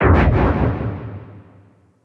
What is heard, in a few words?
pause freeze